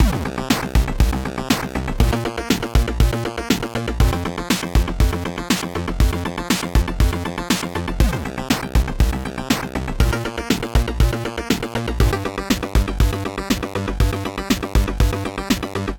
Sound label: Countdown, Retro Riffs, alarms; Google; Pixel-4